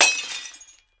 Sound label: jewel break